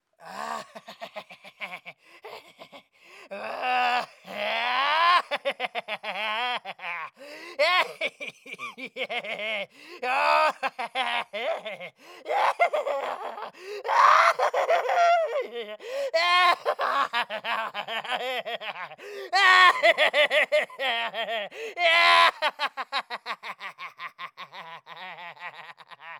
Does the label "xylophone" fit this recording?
No